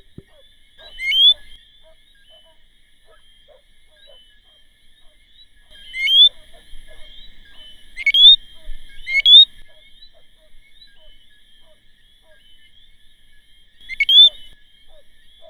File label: Eleutherodactylus martinicensis; Dewynter